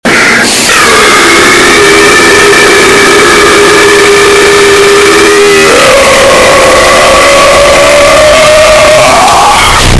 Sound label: arrotao chugs